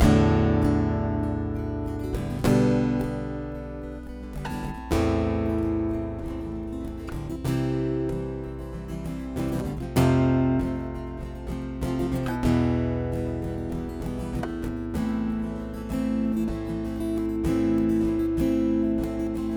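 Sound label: Strum, Acoustic-Electric; Ashley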